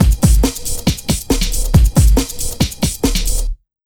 Big Beat Drumloops, 138 BT

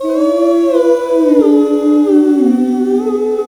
mixed oohs; E-MU Formula 4000 Series Vol. 1 - Hip Hop Nation; Vox FX

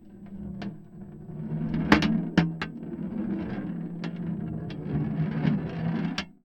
Tin Tray, Marbles, contact mic

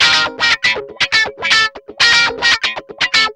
GUI 120 BPM

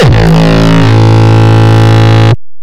Longkick, kicks

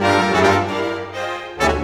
Orchestra, Rock Pop

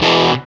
GTR Crunch, Dirty; Club-50 - Foundations Roland

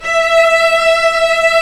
Vcs2 f Slo; String Master Series

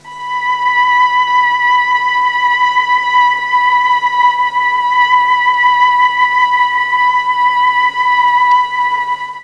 B5 300 VIOLA, Mellotron, STRINGS; Mike Pinder - Mellotron Archive CD

